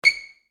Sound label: Footsteps Squeak Shoes On Floor